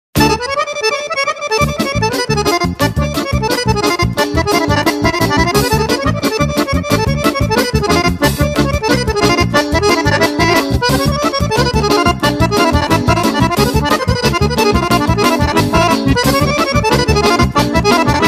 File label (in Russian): balkanskaya garmoshka